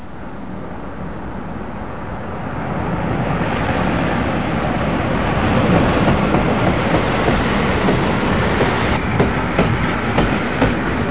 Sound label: S-Bahn